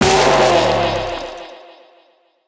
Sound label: butcher hooked